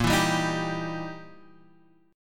E Minor Major 7th Flat 5th A sharp

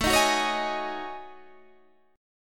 F sharp Minor 6th Add 9th, Guitar

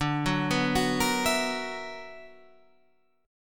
B Minor Major 11th D, chord

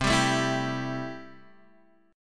C 6th C down Guitar Standard; C6 strummed

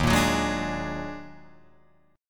Eb Minor Major 11th, Guitar, Drop D

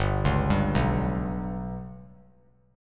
G Major Flat 5th chord; GMb5 arpeggiated; JGuitar

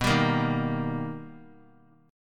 B Augmented Major 7th B, chord; strummed; JGuitar

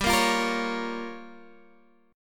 G Minor 7th Flat 5th, Guitar Standard; Gm7b5 strummed; x,10,8,10,11,9; JGuitar

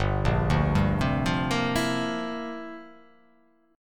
G Minor 13th G down; Gm13 arpeggiated; JGuitar

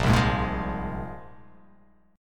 A sharp 7th Suspended 2nd, guitar chord

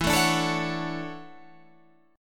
D-Major 7th E; Dmaj7/E; strummed; JGuitar

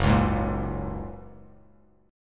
Gb Major Add 9th, down, Bass Standard; strummed; JGuitar

